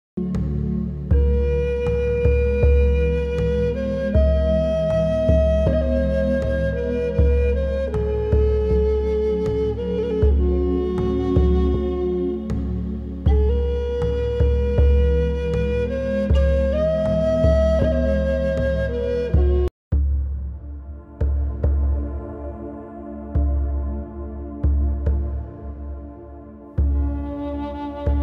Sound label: Flutes of Shamans